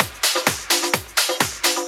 Discohouse Loop 128 BPM

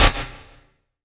DRM HAT Digital; Polyend; Plughugger

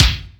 kick, Drums & Perc; AKAI S6000 CD-ROM - Ultimate

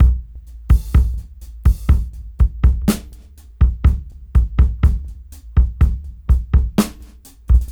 Funk 61 BPM; AMG; Keith LeBlanc Phat Butt Jams

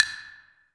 LO BLK VRB

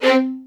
XLD2 C4, LOUD VIOLINS; Q Up Arts; Denny Jaeger Master Violin Library

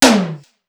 SDS2000 HT, Simmons SDS2000; SDS2000 Factory8

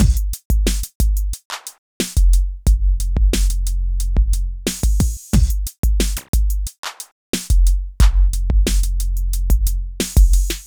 Beat, Hip-Hop

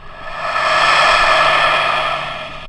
Breath; Zero-G Jungle Warfare 1, 2 & 3; Ambient

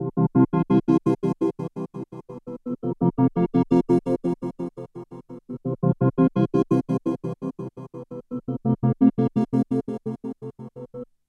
Gated Pad, Drum & Bass Melodic Synth

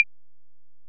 EPerc, Alesis DM-PRO; 300 Drum Machines

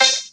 VOC HIT, VOCODER; Zero-G Ambient 1

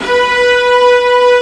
STRING CT B5

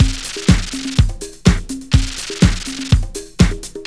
LOOP 124

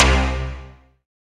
SYN Huge Dig; Best Service Gigapack 1

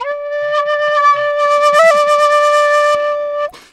Penny 100bpm, Penny Whistle; G; Quazibeat; African Secret

